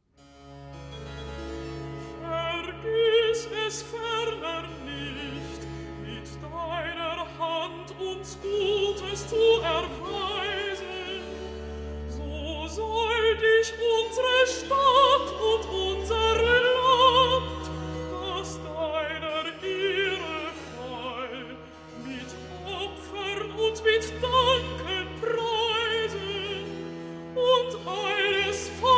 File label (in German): recitativo a; wir danken dir gott; bwv 29; vergiss es ferner nicht mit deiner hand